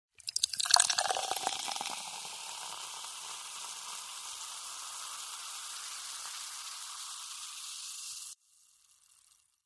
Soda Pour

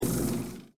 air wrench

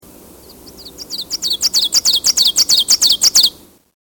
Ovenbird

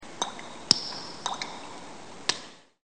DRIP

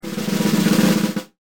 Drums

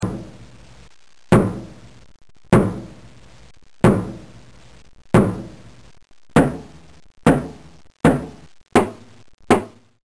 Hammer

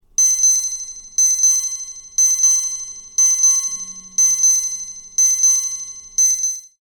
alarm echo

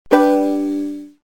ping

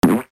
fart

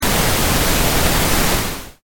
pink noise

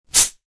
soda fizz

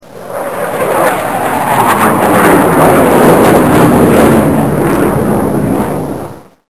jet fly

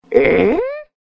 LAUGHER

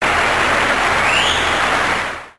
Applauding

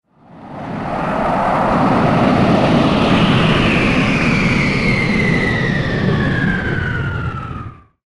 landing

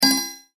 clock

